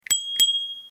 Fahrradklingel; Columbus

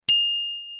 Fahrradklingel Vinyl mini

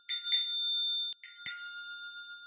Fahrradklingel Classic schwarz

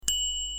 Fahrradklingel Specht Woody

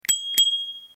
Fahrradklingel Uhr

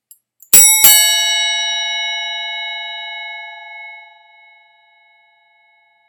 Fahrradklingel Ding Dong Messing

